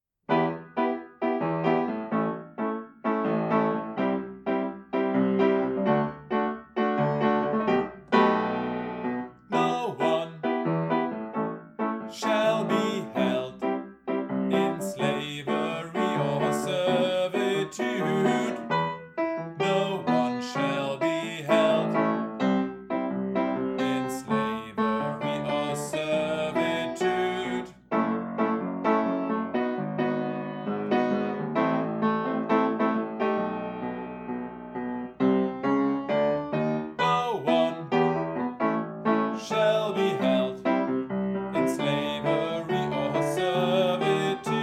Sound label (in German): Klavier; sing human rights article04